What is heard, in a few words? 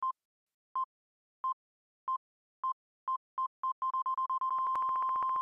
shutter timer; town